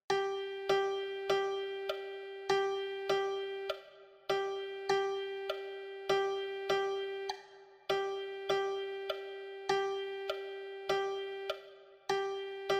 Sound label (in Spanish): percusion